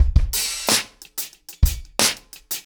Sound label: 90BPM, ACOUSTIC DRUMS